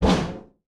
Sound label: recycler jaw shut; Factorio